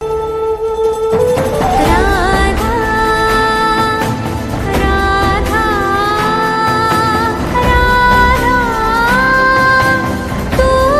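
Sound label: background music ringtone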